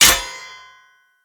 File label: Short Sword Medium Impact Metal; Weapon Impacts; Player; Swords; Environment